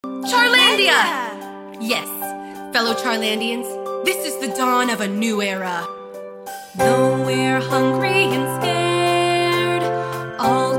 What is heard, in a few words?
Audition Vocals